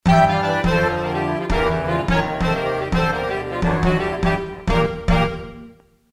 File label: Swingtime; Roland SRX-03 Studio SRX